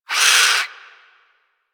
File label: Avichaea Vocal Death; Warframe; Hunting Critters